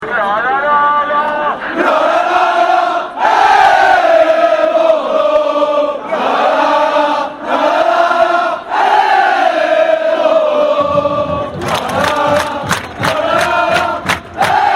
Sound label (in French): Eh Eh Eh Bordeaux, Chants